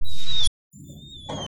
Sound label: whine